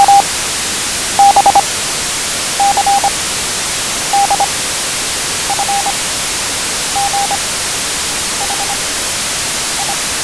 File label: Morse ABC